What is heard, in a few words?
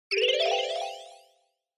Scene Change Short Delay